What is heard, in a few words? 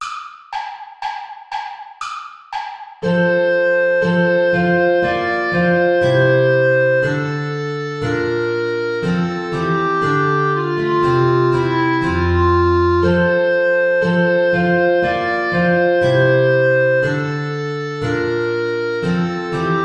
play along without guitar; praetorius michael ist ein ros entsprungen